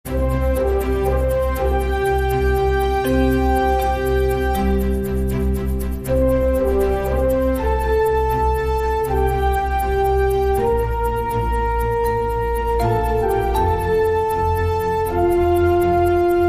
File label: Worldwide Fanfare